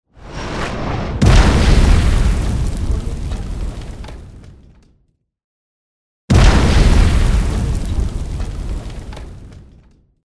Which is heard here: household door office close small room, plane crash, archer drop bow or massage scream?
plane crash